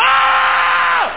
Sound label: Ahh!